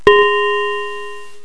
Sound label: BELL NOTE